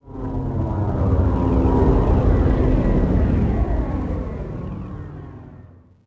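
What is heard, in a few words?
traffic by exterior; Star Wars Obi Wan; XBOX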